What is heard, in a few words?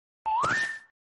whistle; mickey mouse